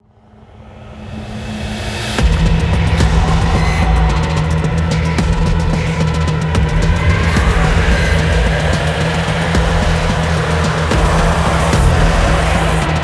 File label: Music Main Ambient; Dlc2 Tech Hell Music Main Ambient 7